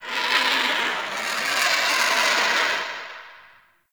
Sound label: Vultures; Ambience; Dungeon Island; Duviri; Warframe